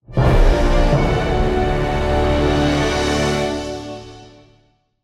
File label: Stingers Onboarding Stinger Positive 148BPM; gamerip; fortnite